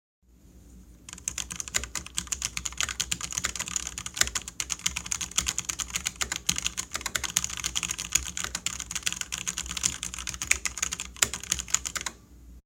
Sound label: soft tactile, keyboard